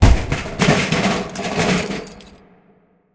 projector Fall Reverb

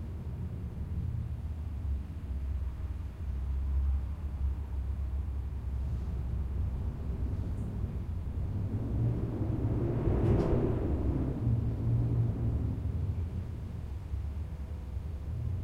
school Silent Loop

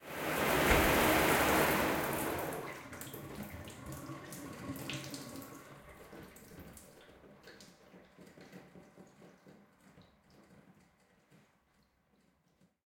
shower Muffled End

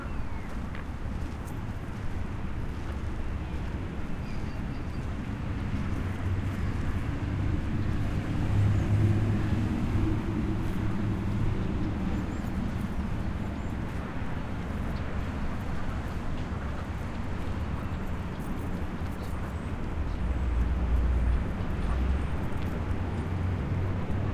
outdoor Loop

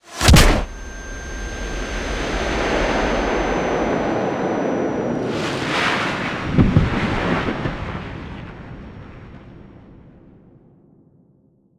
fang Punch

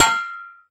Dink

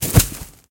garden fall

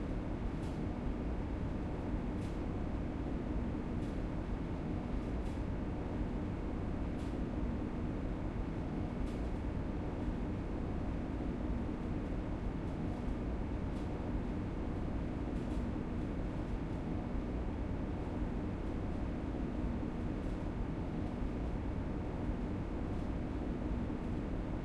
apartment loop

